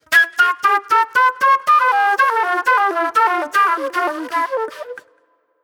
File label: Old Soul Flute; Sonic Foundry (Sony Creative Software); Manhattan Flutes; Good Times